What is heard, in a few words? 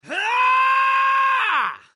Yell